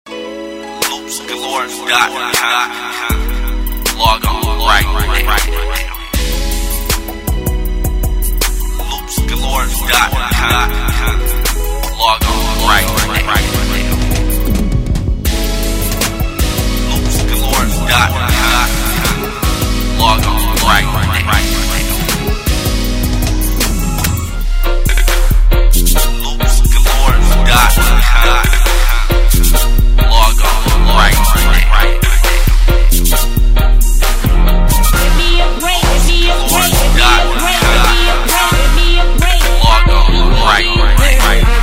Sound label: Hip Hop Loops